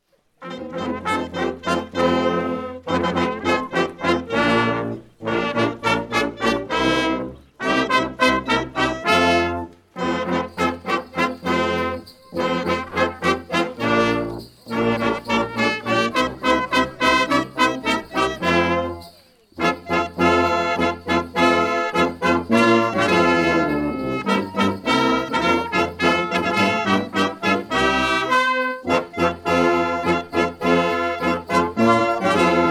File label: jingle bells